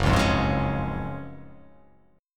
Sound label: F sharp Major 7th Suspended 2nd, F sharp, down